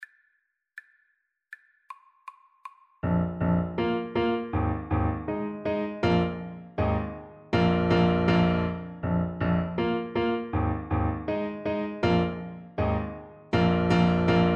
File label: thumping blues, saxophone